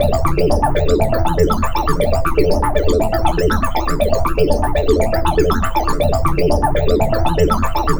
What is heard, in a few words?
Synth Arpeggio, Synths; Eclectic Electronica